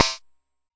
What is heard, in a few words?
Jomox MBrane, drum machines; Jomox MBrane 11